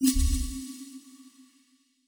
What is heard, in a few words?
Spin Button Click